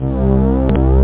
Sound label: ANALOG ST